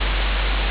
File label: ambience room tone